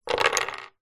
foley wood blocks small x4 set down together on wood table